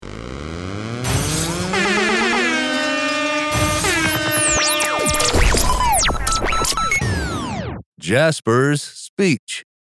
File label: jingle